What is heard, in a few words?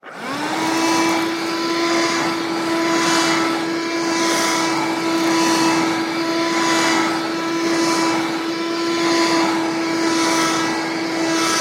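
sounds of a handheld vacuum cleaner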